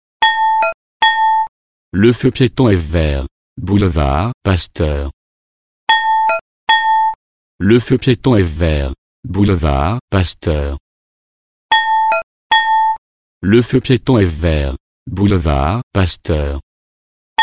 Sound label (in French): ritournelle et message parlé boulevard pasteur